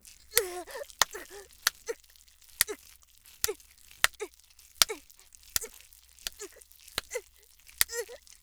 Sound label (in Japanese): 痛気持ちいい